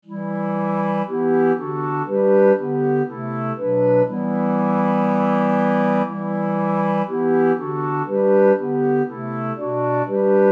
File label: robot clarinets; Footdee